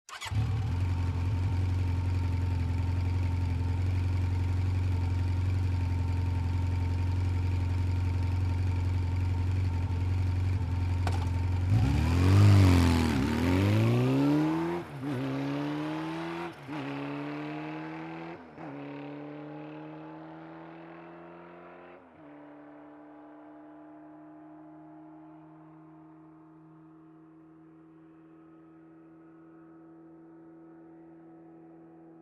Motorcycle starts, waits, drives quickly

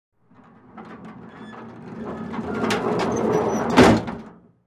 The metal compartment door closes

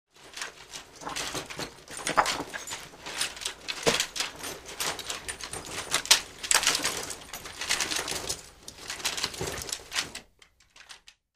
Running, moving with weapons; steps

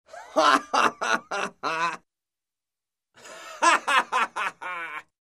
Male laughter

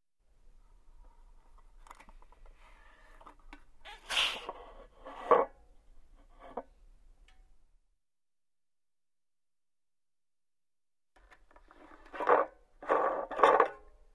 Motorcycle gas cap

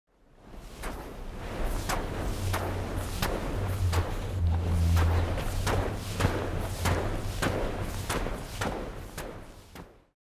Barefoot steps on wet sand

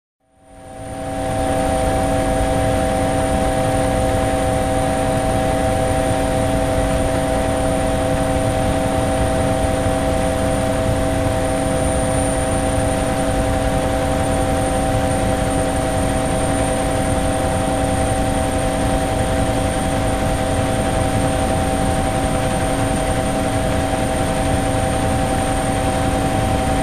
Helicopter in flight inside

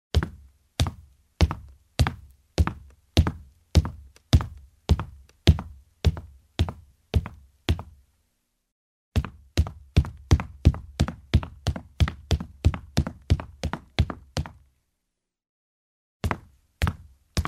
Steps on a wooden ladder in shoes

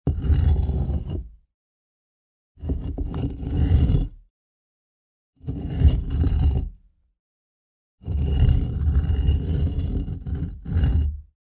stone dragged on stone

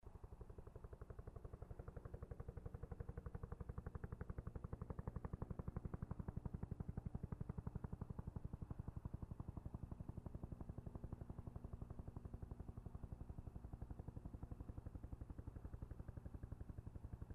Helicopter flies slowly

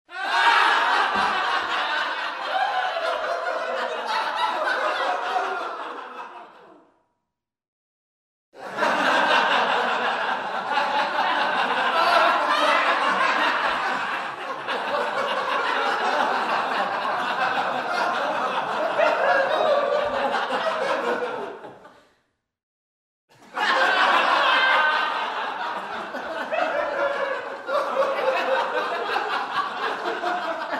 Laughter of the crowd in the room

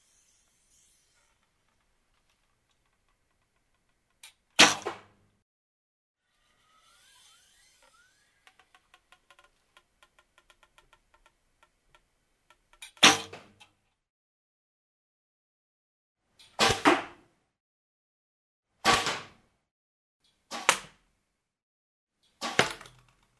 Bow, bowstring is drawn, arrow hits the target; cold weapons

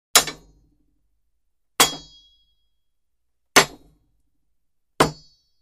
Sword strike; cold weapons

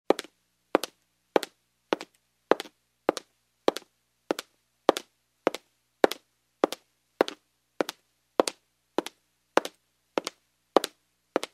Steps on the wood floor in heels

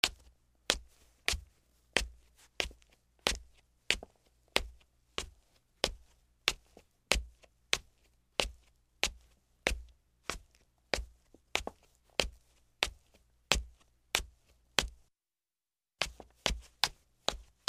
Steps on a wooden ladder in shoes

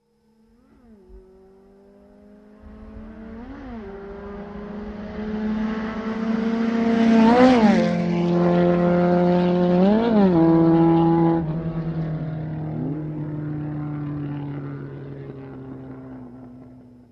Ring motorcycle passing by; motorcycle racing

